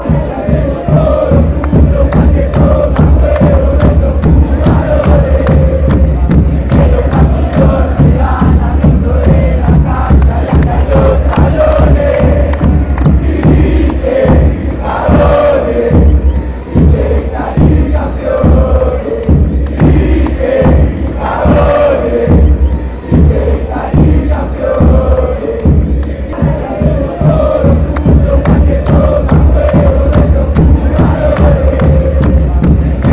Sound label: huracan chant